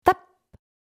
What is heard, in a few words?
Tap; LES ONOMATOPÉES